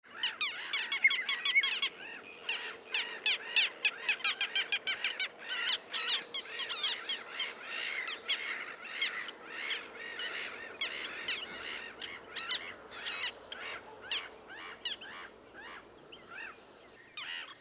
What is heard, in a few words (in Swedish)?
Dvargmas